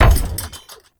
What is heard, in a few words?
Layer Shot, weapons; Bubble Gun Pistol; Kompressa Prime